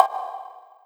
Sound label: bongo hi; realdrum